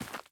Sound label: Footstep Dirt